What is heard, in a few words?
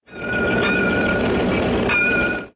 light rain bell